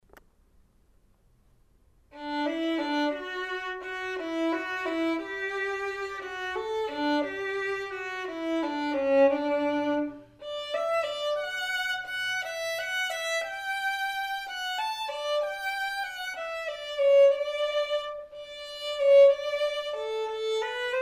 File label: vln perf